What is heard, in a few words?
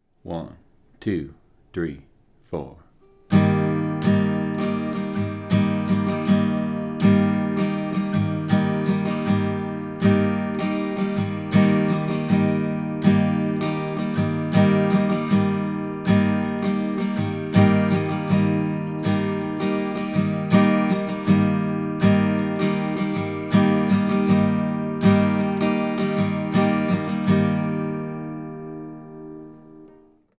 strum pattern